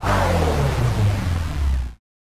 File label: Bass Drop; SoundsCrate